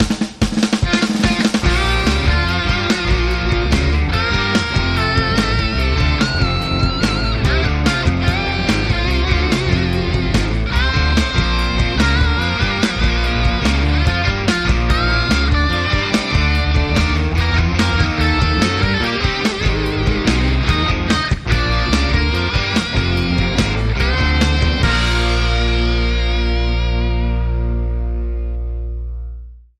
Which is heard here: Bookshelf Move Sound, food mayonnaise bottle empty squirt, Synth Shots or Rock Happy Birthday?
Rock Happy Birthday